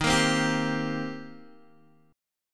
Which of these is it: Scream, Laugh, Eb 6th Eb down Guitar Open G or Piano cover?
Eb 6th Eb down Guitar Open G